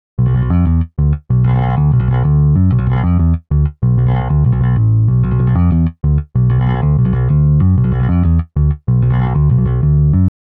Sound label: bass intro